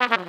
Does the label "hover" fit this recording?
No